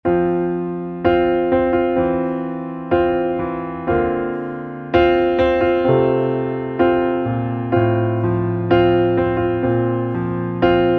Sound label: Underscore PIANO; Worship Flow